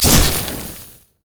basicattack; Yasuo

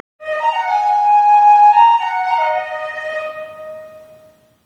tidalwave flute; nami koi

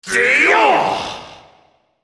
spell cast effort; Malzahar

